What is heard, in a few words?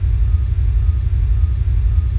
station ambi; Earth and Beyond; EA Games